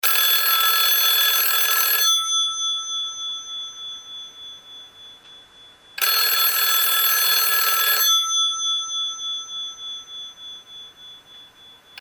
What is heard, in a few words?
ring ring